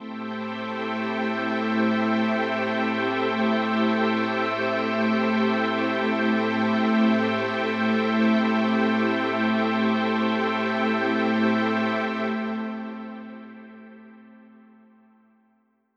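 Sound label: Ambi String A minor 7, synth orchestra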